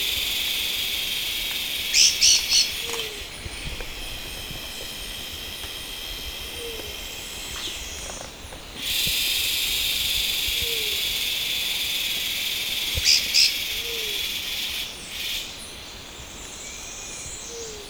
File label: Phyllomyias urichi First Recording